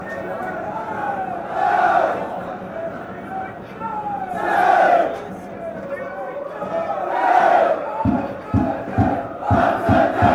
spell it out, chants

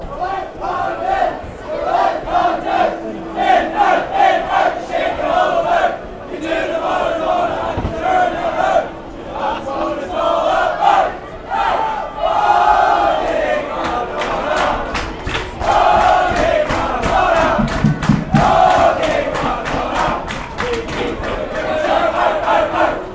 you do maradona fanchants

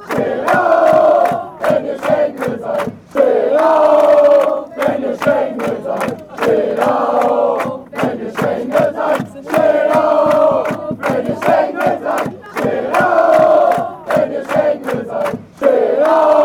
chants; stand up

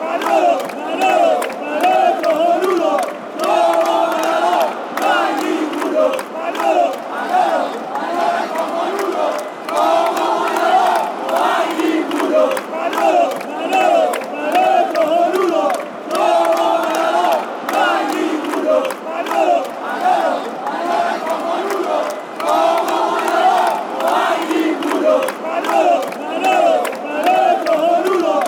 spanish chant

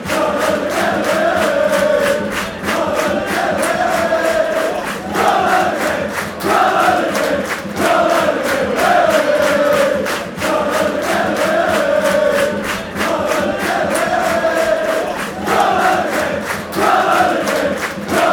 fanchants; england

